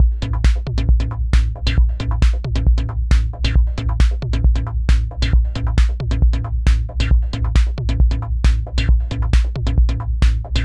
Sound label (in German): Kompressor Vergleich Original 606 Beat